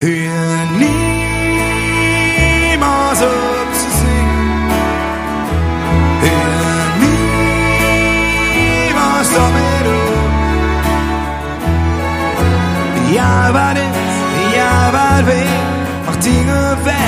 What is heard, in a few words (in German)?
live mit orchester